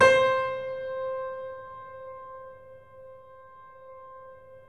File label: The Piano, sustain